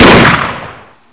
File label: ak long, weapons